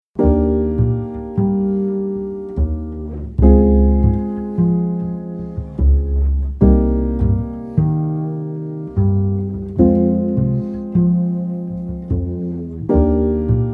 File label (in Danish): Akkordraekke